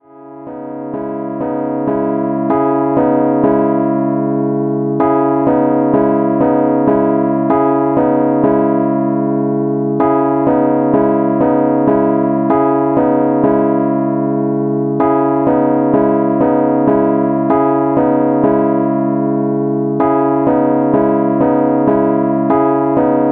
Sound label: Rhodes chord, hip hop chords; rhodes chord 18